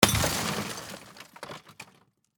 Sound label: Impact Automobile Window, Shatter; Glass; Crashes (Sound Effects:Objects)